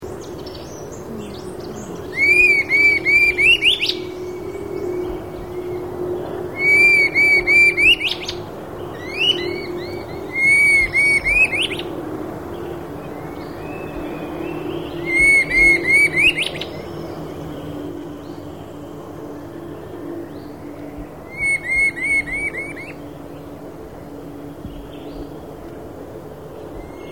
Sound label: Picapalo Colorado, Campylorhampus trochilirostris, Red-billed Scythebill; cantos